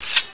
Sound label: sword, weapons